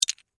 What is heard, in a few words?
Legos Drop Move, Together; Foley; Miscellaneous Toy; BlastWave